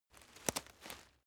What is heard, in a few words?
step leaves branch crack short; schritte